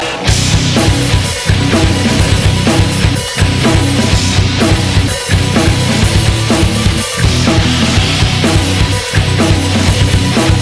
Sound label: guitar solo